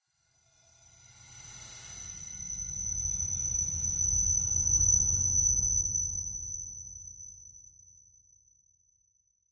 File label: Ring High Harsh, tones & melodic